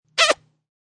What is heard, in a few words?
Quick Squeal Kiss; Kiss sound.; Kissing